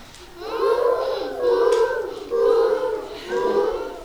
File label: Kids Mourning Dove; Park; Kids Morning Dove